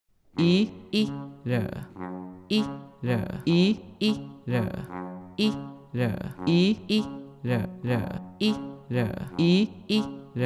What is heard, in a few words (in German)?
jodlerfest